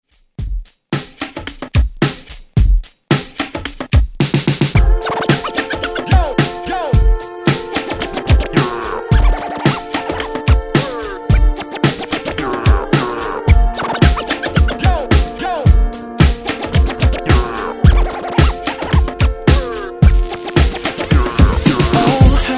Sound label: Hype Scratch Re Drum 110Bpm